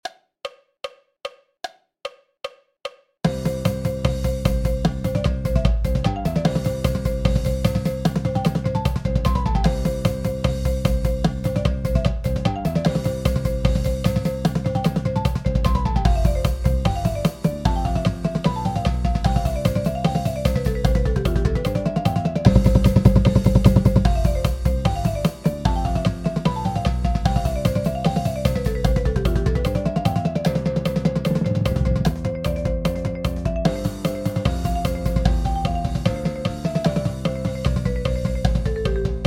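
150 BPM Paradiddle Breakdown with countoff, Marching Percussion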